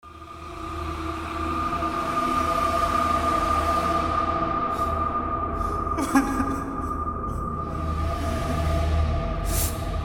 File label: Ambience; SCP-096